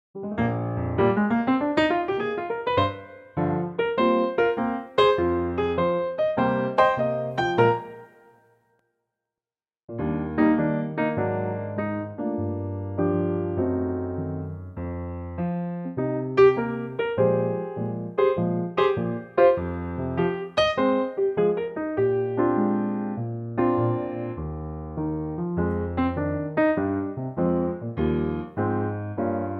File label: Eb piano